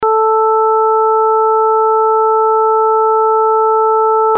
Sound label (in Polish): 440 Hz i 1 oraz 2 harmoniczna